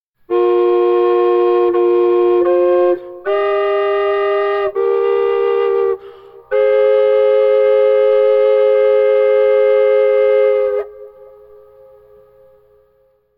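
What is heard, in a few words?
double flute latido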